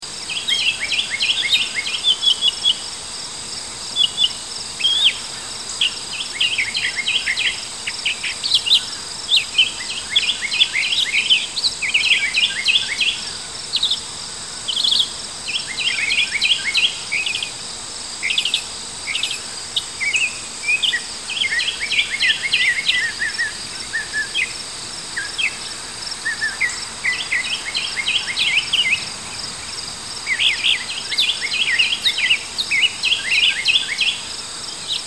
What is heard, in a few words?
Ambience Birds Crickets